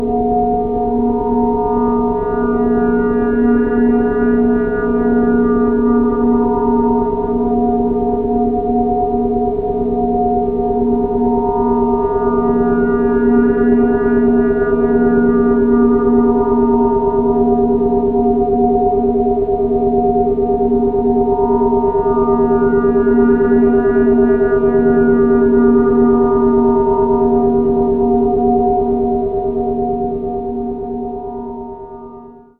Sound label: nice Drone well Tuned